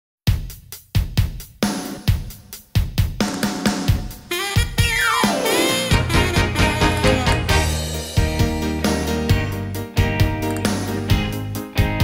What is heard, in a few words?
instrumental; amp regional arts and media program